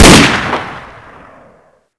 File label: deagle; cstrike